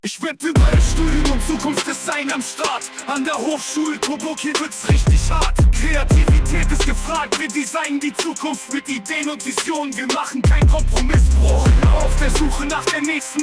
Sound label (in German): Deutscher Hiphop Song; ZukunftsDesign